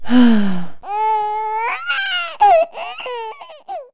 sigh + baby cry; Vitra

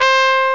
trumpet

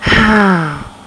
relief; People; Sound Library 2000; Wayzata Technology

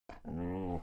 dog; WMBonusXP

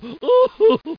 CROC LAF

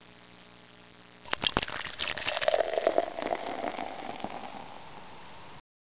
pour; Broderbund Print Shop Multimedia Organizer Deluxe Edition

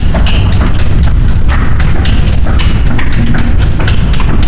mechanism; PC Gamer IT CD 41; Daikatana

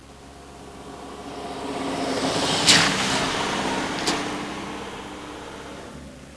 splash, cars; transpo

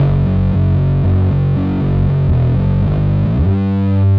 BASS; Evolution 075

